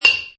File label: Metal Jar Dink; Metallic; Metal sound 46 Jar Dink; Multimedia